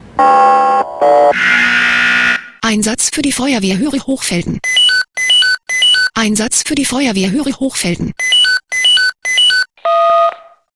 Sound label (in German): SMS Alarmierungstone; FW Hoeri